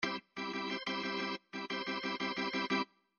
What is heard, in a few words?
chord lines hardrock; Midi Files 1000